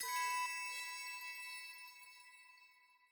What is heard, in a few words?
Alchemy Flute Combo